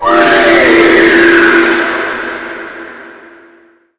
grab; vermis; quakemapping; mod quoth

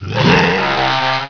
og leap, ogre; quakemapping

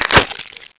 attack munch, voreling; quakemapping

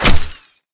GLASS HIT; mod slipgate

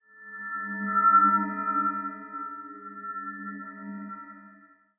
ghost idle; quakemapping; dknight

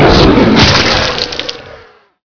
bile atk; mod quoth; gug

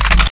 load short, weapons; quakemapping

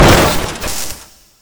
mach bk, impact; mod quoth

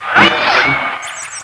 idle, sentinel; quakemapping

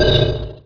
crosuse; quakemapping; mod quoth

